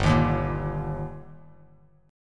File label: G Minor Sharp 5th G Bass Standard, chord; strummed; JGuitar